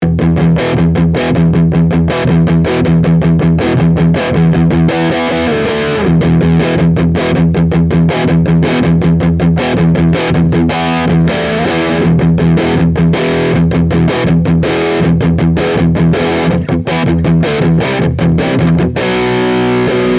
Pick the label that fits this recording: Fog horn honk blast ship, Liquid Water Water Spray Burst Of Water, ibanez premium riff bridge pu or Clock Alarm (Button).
ibanez premium riff bridge pu